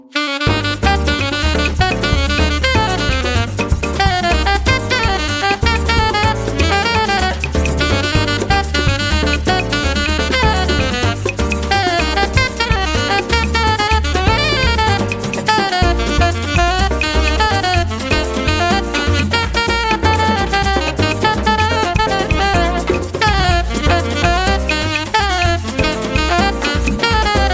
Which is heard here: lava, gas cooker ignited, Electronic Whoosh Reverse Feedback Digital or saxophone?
saxophone